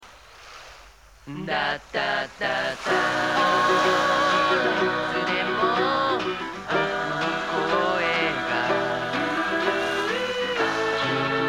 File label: humming